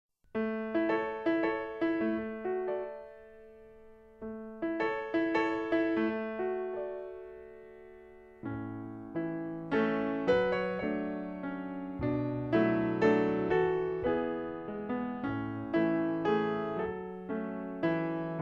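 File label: accompaniment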